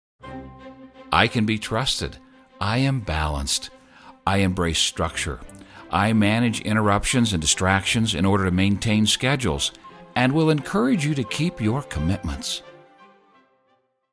music; Consistent balanced

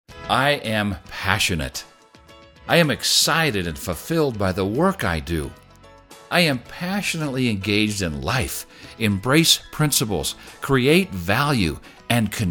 music; Passionate

